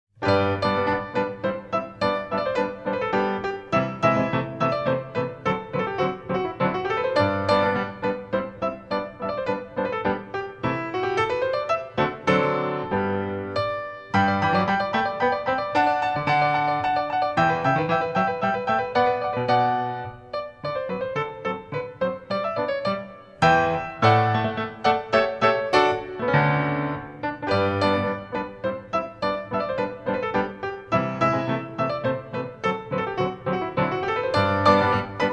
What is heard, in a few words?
Polonaise